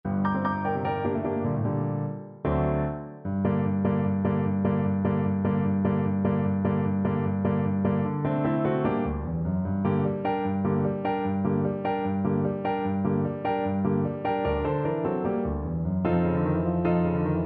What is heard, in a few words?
accompaniment